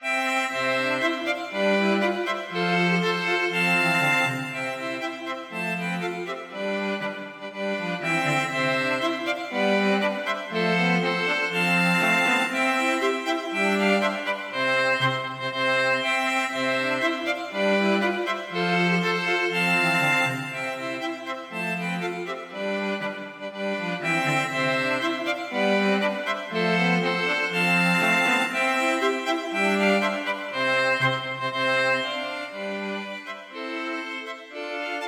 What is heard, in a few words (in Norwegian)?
March for strykekvartett; Collett